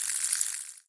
spin end; fidget spinner battle

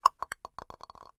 landing; draw pixels

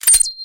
button; agent gun

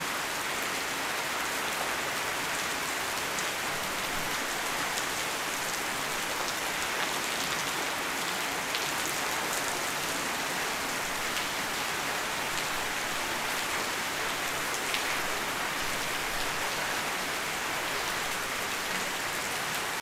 rain; free kick2

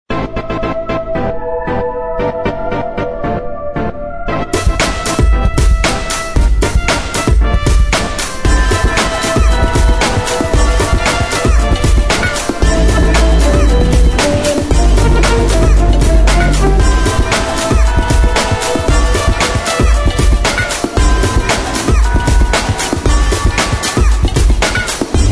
Game Over; johnny upgrade

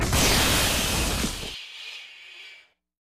robot steam explode; steam camp

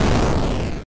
explosion; pixel rocket

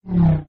car spawn; traffic command

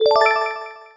speedup; memory challenge

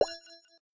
button; space tunnel

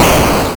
explosion; avoid the spikes

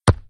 ball kick; crazy eights

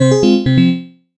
lose; red ball2

